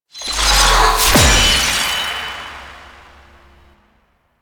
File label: Empowered Geode Cast; Warframe; Citrine